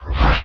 Menu Horror

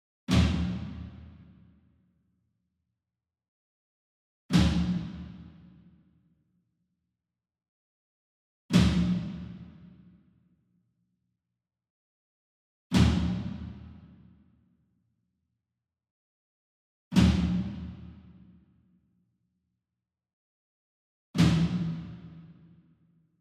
SINGLE DRUM Tom Concert Flam Hard Beater Far; boom library; Cinematic Strikes Construction Kit

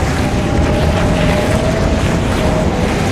Tornado Weapon Black Hole Loop; Destruction

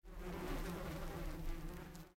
Insects; Blind Quest - The Frost Demon

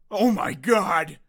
town human far; Blind Drive

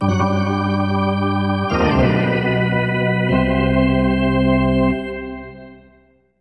Hockey Organ

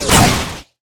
Flame Thrower Shot Burst, Weapons

